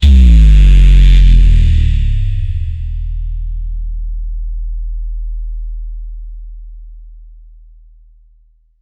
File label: singular ghatam bass hit; musical instruments